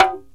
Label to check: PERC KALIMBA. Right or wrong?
Right